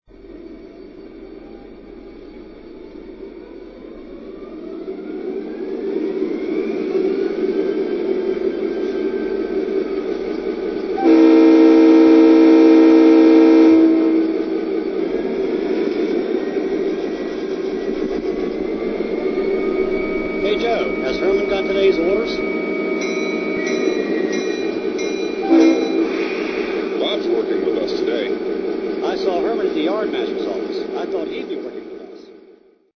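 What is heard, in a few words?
EMD GP20, locomotive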